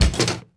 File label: bad part metal